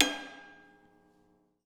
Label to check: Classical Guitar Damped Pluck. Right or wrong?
Right